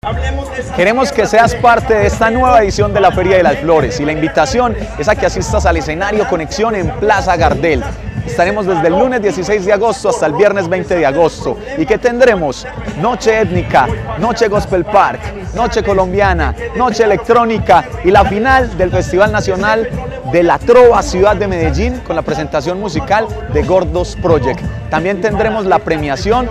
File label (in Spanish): ritmos musicales